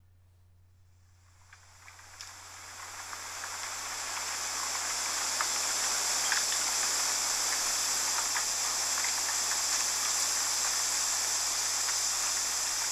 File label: Rainy bamboo